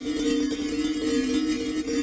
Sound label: Bell Grain Poly